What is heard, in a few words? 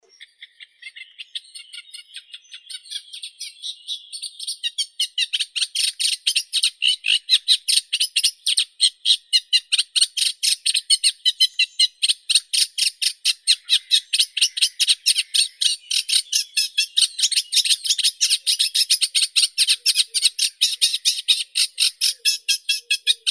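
reed warbler, acrocephalus scirpaceus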